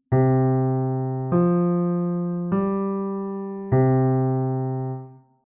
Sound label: Bass; Harmonielehre